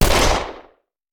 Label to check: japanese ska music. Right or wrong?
Wrong